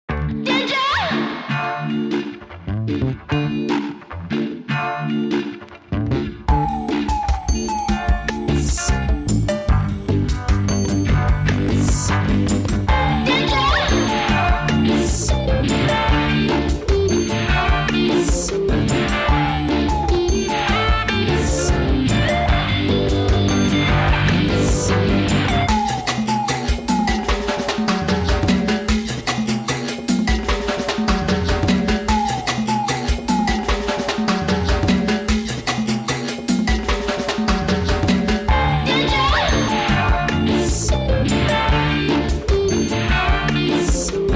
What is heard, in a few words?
Intro Music